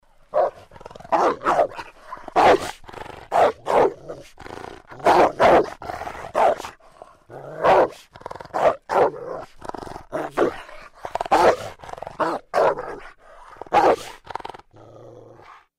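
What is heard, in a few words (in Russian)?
lay volka, wolf barking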